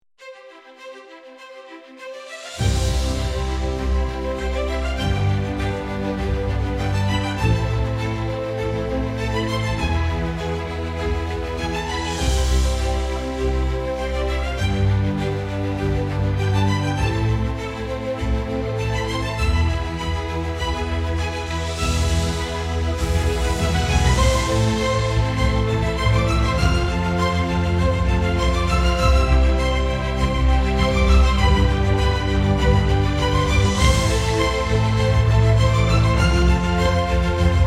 majestic overture, fanfare muzic

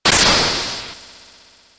lightning voiced sharp close

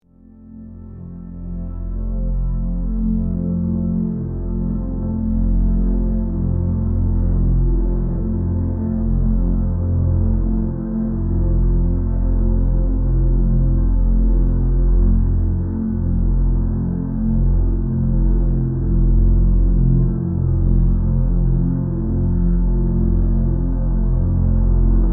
whispers of calm, ambient

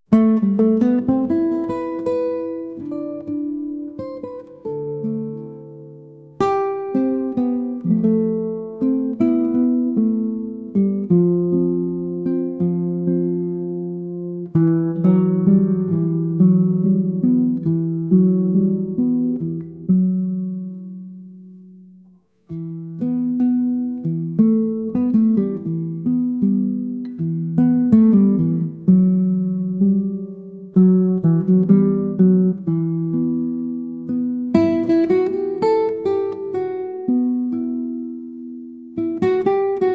cape town serenade, afrikanskaya

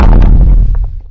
threw a huge stone; zvuk kamnya

